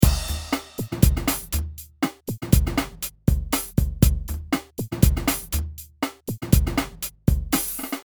beat

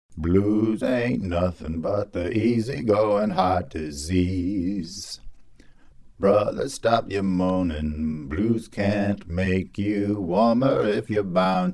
Blues

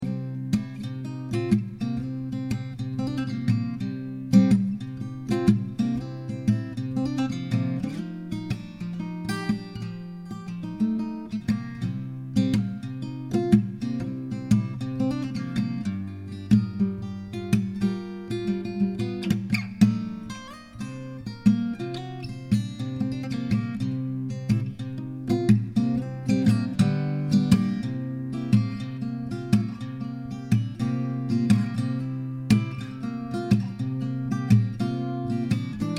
C120 Acou GTR blooz motif

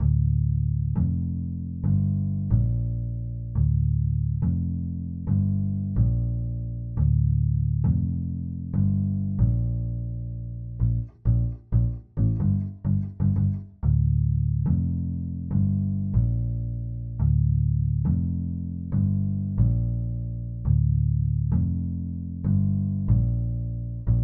Double Bass

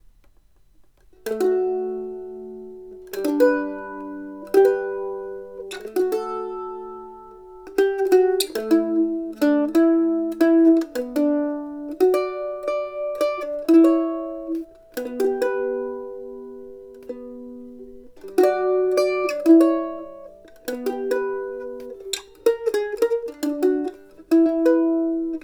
Mando dry; MandoMilleRegretz

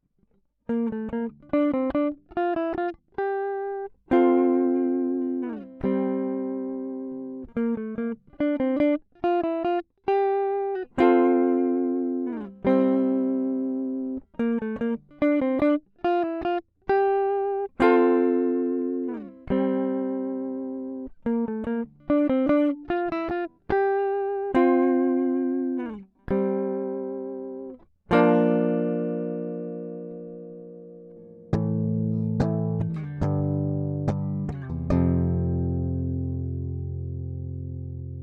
Riff

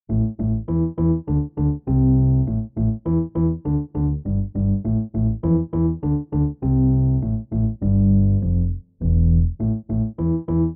macfunk

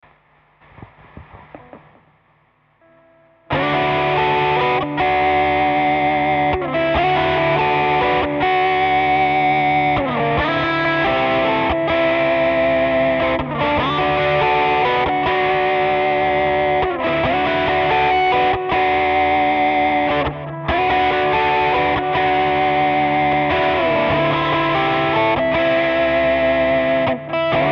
D140 GT Riff Trash

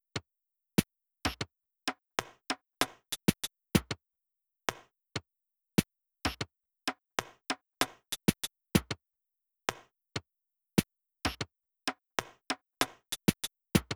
drums 96bpm

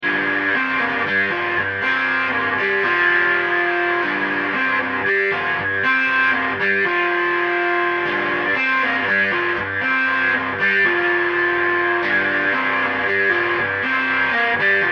G120 Riff

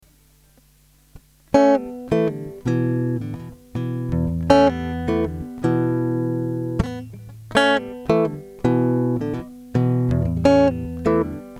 Em 160 acoustic riff

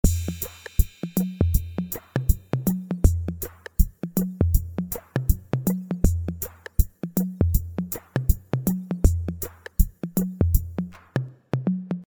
beat 3; Mixter Plus Deep Roots beat pack

